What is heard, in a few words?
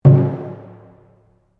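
Drum Taiko Barrel forte, Japanese Taiko Drum; Miscellaneous Musical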